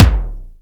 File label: KICK SMACK; E-MU Formula 4000 Series Vol. 2